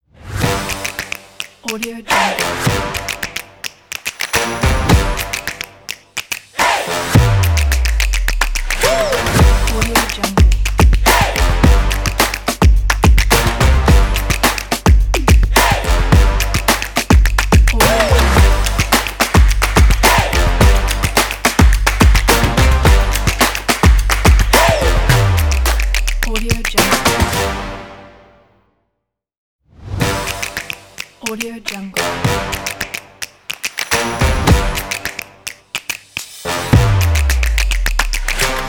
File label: upbeat energetic action sport ident